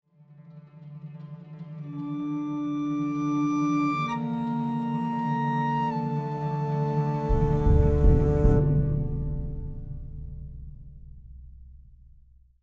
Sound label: Game over you lose nordic vikings